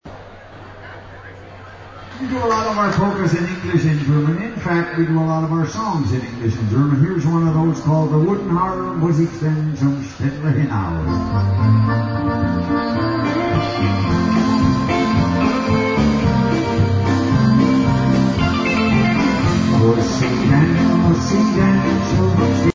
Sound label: POLKA